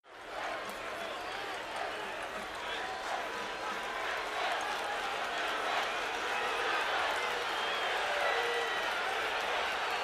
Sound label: Football Crowd Defe, sports arena football